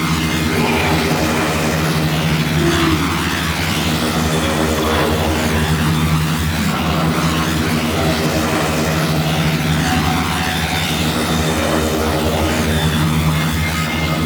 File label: Feast Loop; Warframe; Revenant